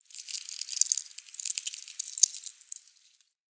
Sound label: Forest Dry Leave Move; Ambience; Midrath Island